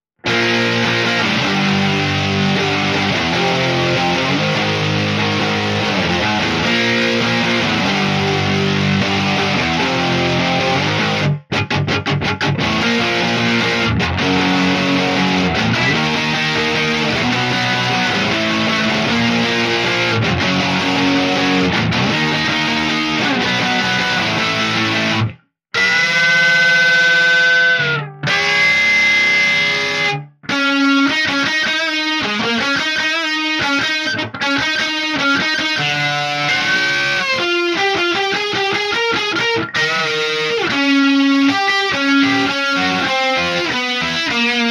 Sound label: Guitar Riff